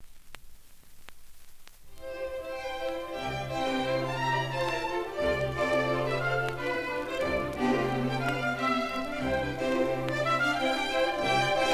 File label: Forlane; Bach; Suite No.1 In C Major, Bwv 1066